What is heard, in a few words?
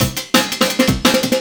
Pitch 170, Drum&Bass Breaks; EZ Rollers